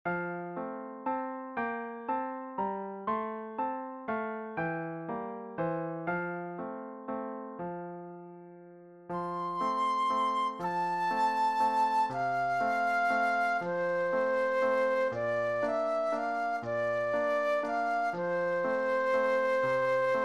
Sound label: Flute Solo